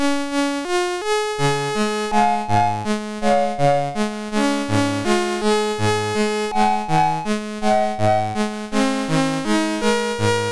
Synth Sample, MOS6581R3

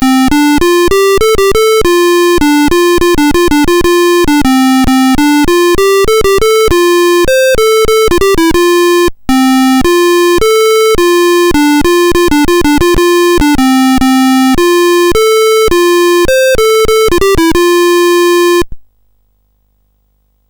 Reversi BASIC, CSG8580R5

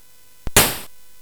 Space Gun

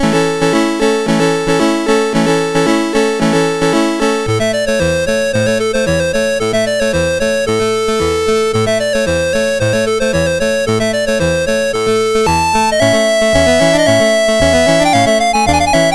Turkish Folk Dance, MOS6581R3; Joseph A Grau

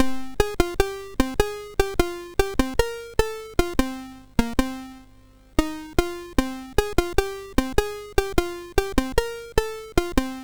One Voice; MOS6581R3

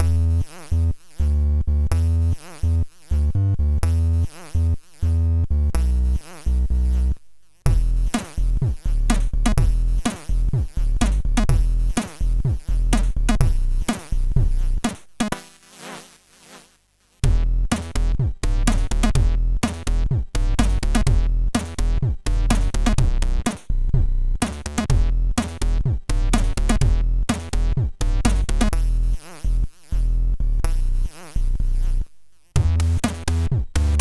Tekno, sid MOS6581R3

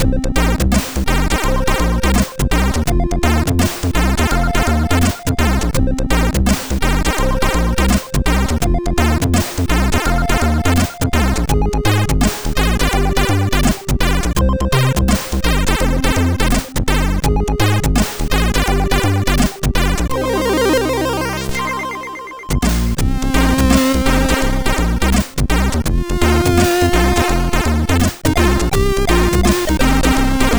menu, MOS6581R4; Eardrum Massacre

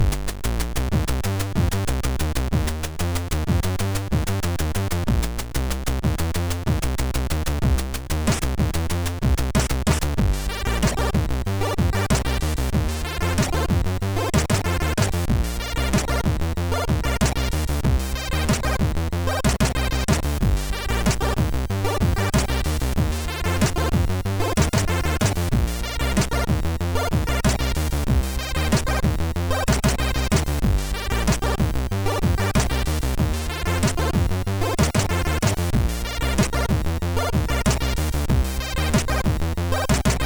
Looping Crackmuzak, MOS6581R3

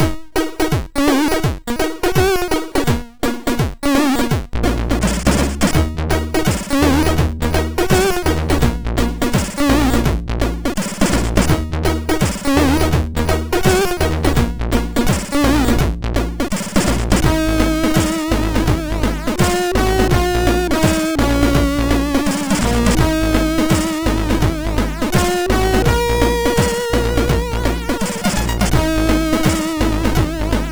Future Reggae

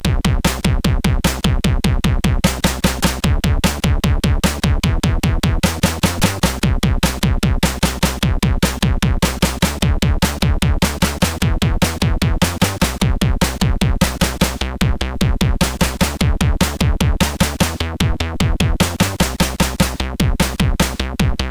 Live, CSG8580R5

